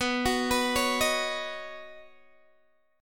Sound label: B Major Add 9th B Guitar DADGAD; arpeggiated; 21,21,21,18,18,x